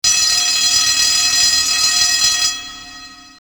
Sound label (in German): glocke